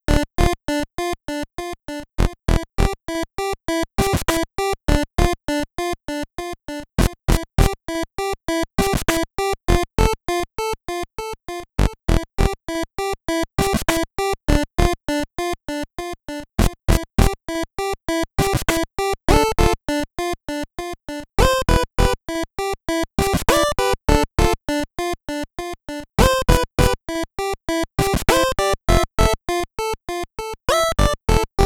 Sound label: Eerie; Voidspire Tactics